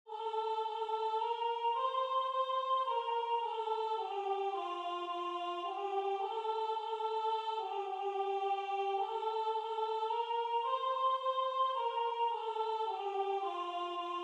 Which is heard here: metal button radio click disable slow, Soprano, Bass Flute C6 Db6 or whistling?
Soprano